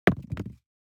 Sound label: DICE on Neoprene, Throw and Roll, Foam, 2 Two Dice; Samples; ArticulatedSounds; DICE Sfx Party (Extended)